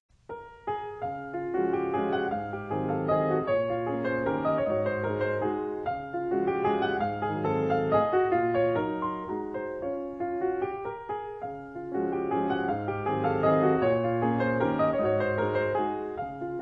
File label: Op.70, no.3 in D flat major